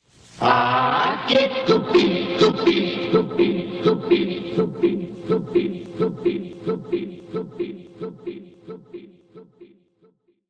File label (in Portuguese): Vinheta; Super Radio Tupi SP